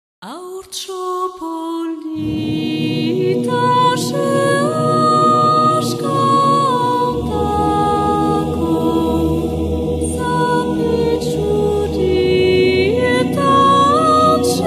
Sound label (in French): berceuse basque, Choeur